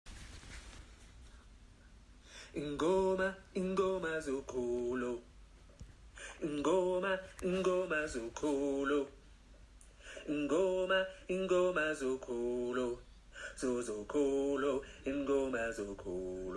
Tenor, gospel choir; INGOMA

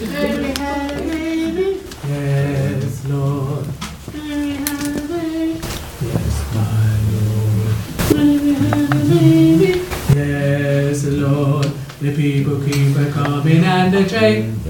Mary Had A Baby Bass

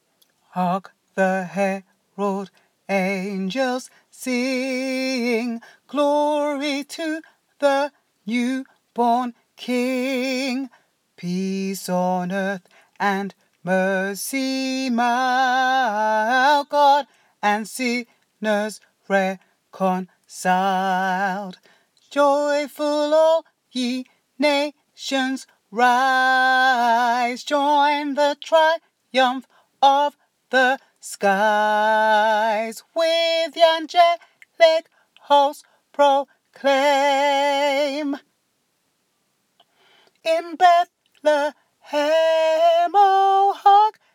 altos, gospel choir; Hark The Herald Angels Sing

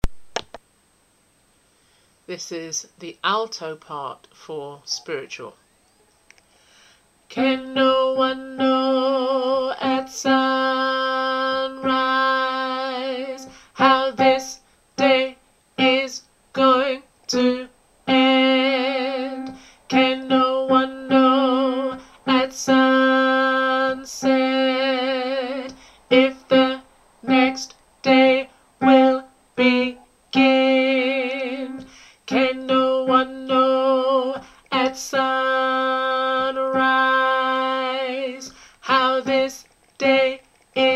Spiritual Alto

